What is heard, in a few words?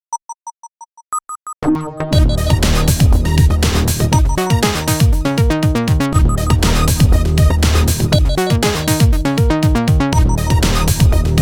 Techno Signal